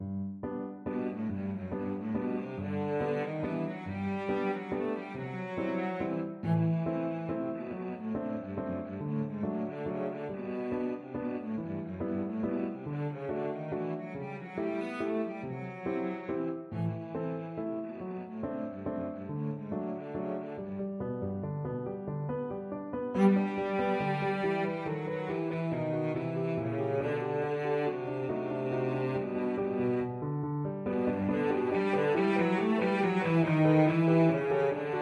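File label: violoncello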